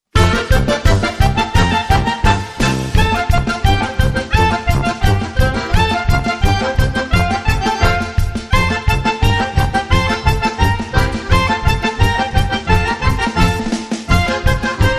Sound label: Happy Circus